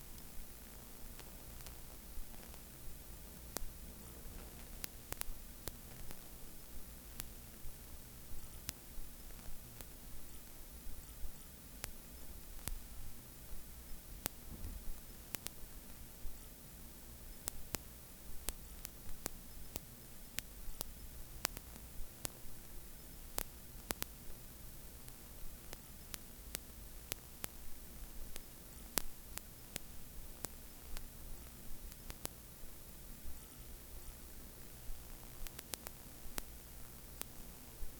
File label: low level broadband hiss t; noise